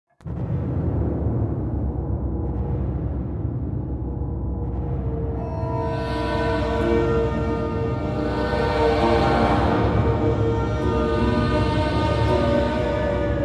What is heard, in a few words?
General Ambience; God of War 3; PS3